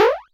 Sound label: invalid drop; Klooni1010